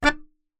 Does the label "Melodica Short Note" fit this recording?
Yes